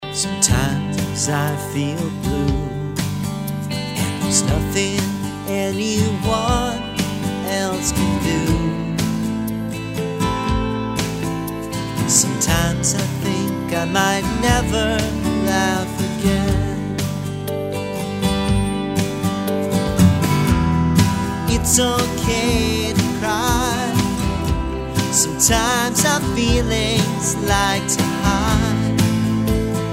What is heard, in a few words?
laugh again